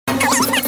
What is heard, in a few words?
whomp